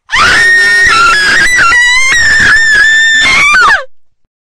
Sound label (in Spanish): Mujer gritando con miedo, Woman screaming